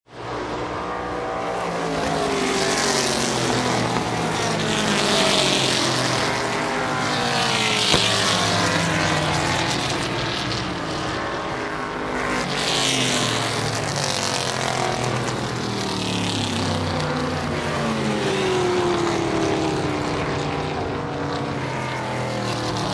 group laps, engine

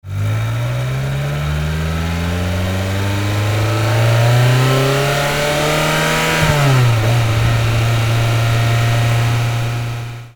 ferrari 355 under hood

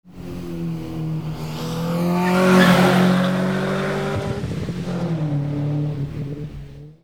impreza rs drive by

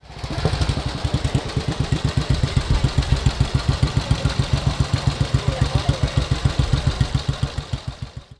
Ariel 500

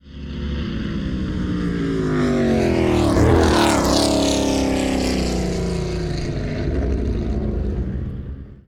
McLaren M6B decel

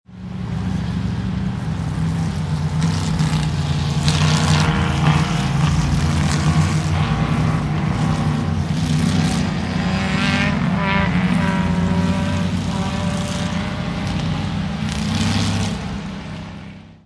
laps

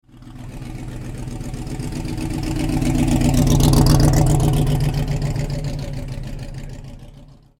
Allard K2

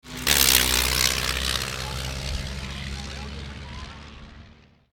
Stanton Spl Track; 1953 Stanton Brothers Special; 2003 Skope Classic Ruapuna Raceway, Christchurch, New Zealand

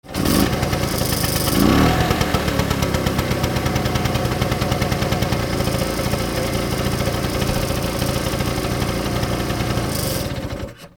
vespa

